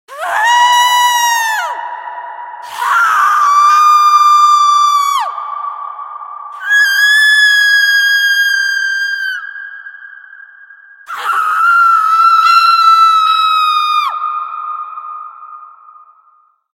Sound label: Screams Reverb